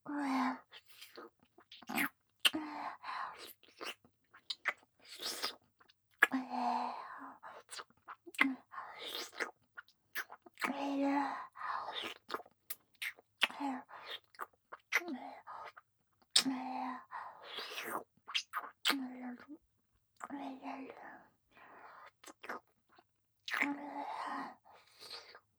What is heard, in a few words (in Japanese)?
フェラ, ASMR; loop音声